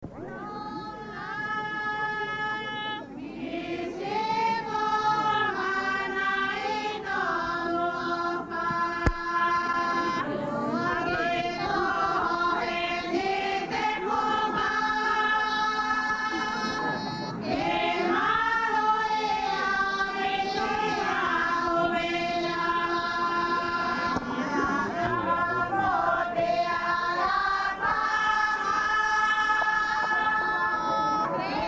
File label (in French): filles, wallis futuna; hihifo